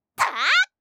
たあ！(中攻撃); バトル系

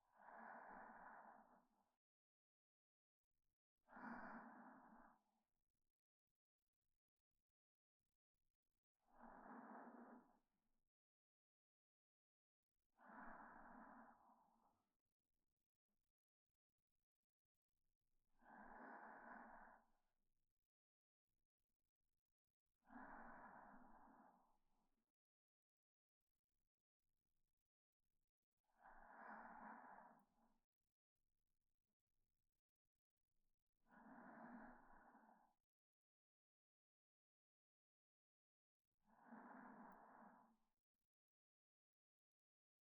寝息(反転)

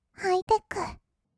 ハイテック; 1200 kawaii voice!!

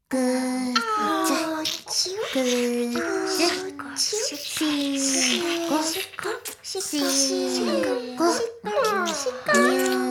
しこしこ フェラ Mix, ASMR; 魔法少女マスカリマッスル

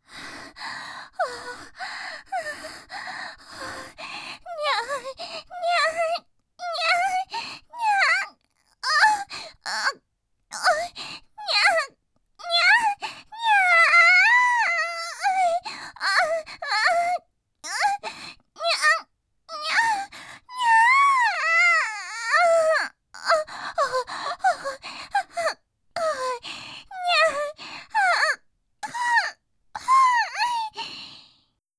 あえぎ

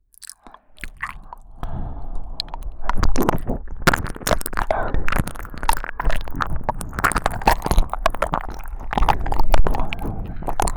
両耳舐め 加工あり; ノイズ処理あり

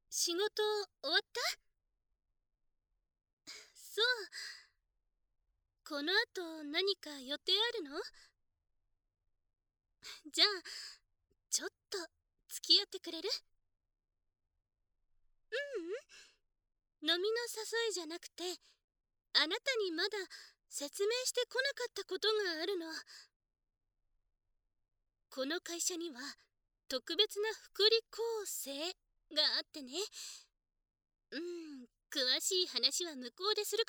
耳舐め, ASMR

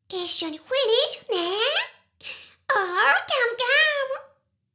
シコシコ音声; 魔法少女マスカリマッスル

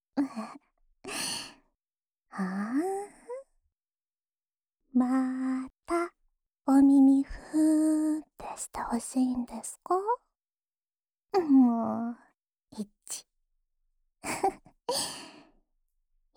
安眠用お耳ふーふー（左耳）, ASMR

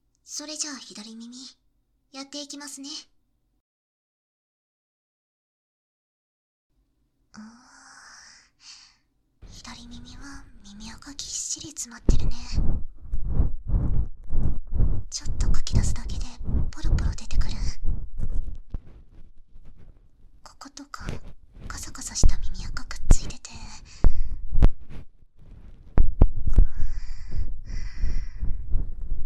年下の京都弁を話す後輩から誕プレとして耳かきしてもらった。左耳

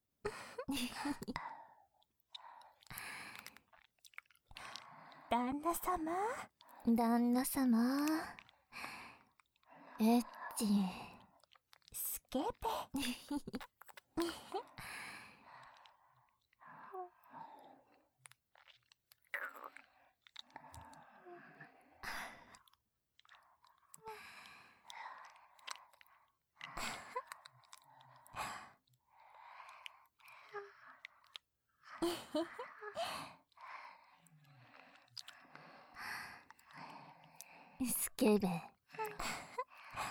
両耳いたずらフェラ（ゆっくり）

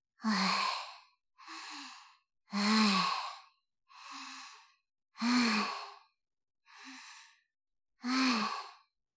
息切れ(余裕)

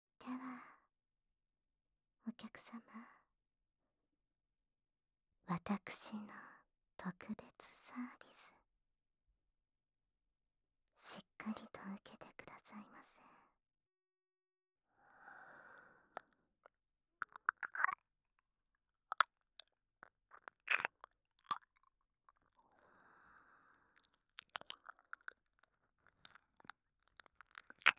お耳を舐めさせてもらいます, NoSFX; 私の特別サービス; ASMR

